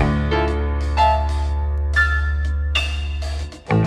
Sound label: piano tense scene; Loops; Sad